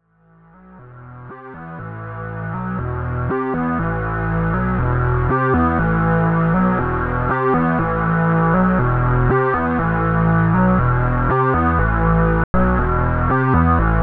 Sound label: Wavetable Lead; Ableton 10